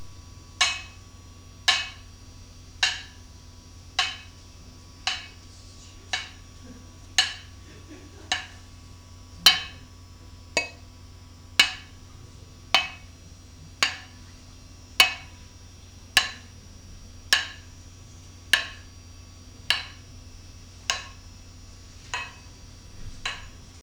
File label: clangs, binaural